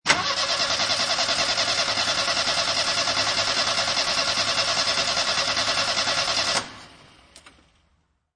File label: Test Car Engine Fail, Testing a car engine, attempt to start car, ignition fails. Starter motor run; Starter motor running but no ignition.